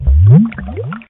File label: bubble; openspace3d; aliencrash